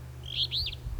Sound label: feebee; Traill